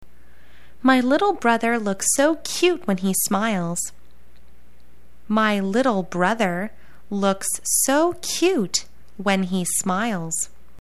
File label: cute